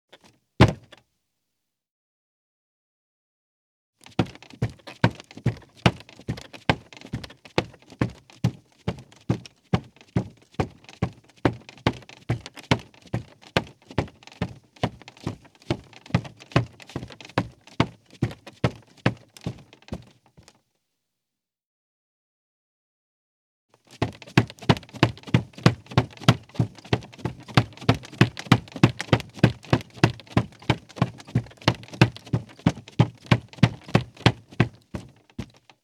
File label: Footsteps, Foley; Sound Ideas 6016